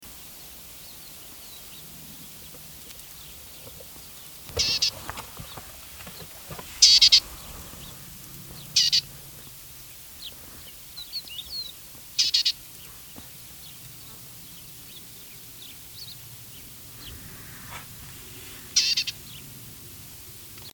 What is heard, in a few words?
Desert Lesser Whitethroat call; Oshayqer KSA; A. Al-Sirhan